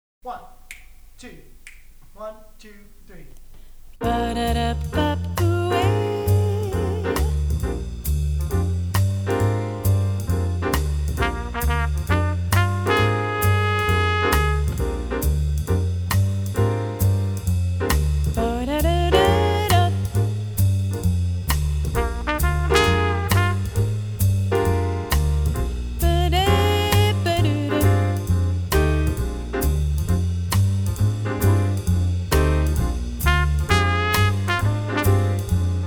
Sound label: Bb7 Chord Tones Call And Response